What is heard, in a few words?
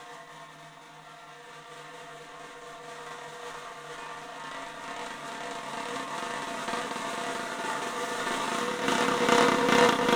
SNRLMO, 3D Drums, MAR O MOTION; 3D Sound